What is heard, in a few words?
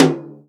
tom, Real Drum Kit, jungle kit